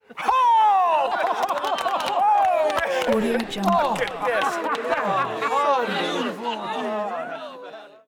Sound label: crowd cheers; prosoundfx